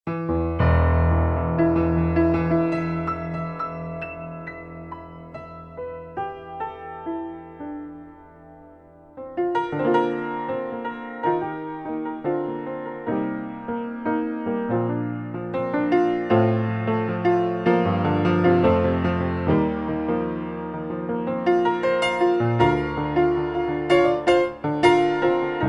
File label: Vintage Grand Untuned Pop